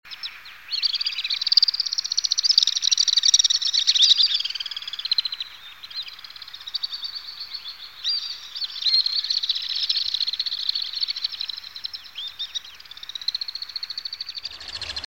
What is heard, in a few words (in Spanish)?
vencejo real